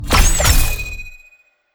loyal sword

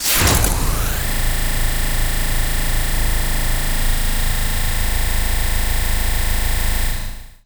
chainsaw extension

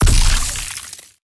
ui shop lock

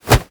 punch

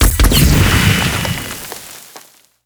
gold explo buff

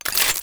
axe gun

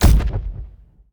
magic

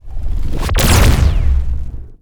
energy cannon

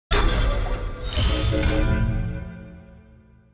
ui collapse up

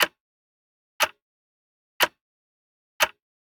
clock sound loop

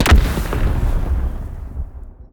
black cannon

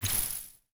heal

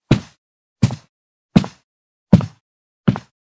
footsteps tatami

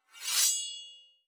sword ex sheath

